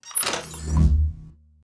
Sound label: lever on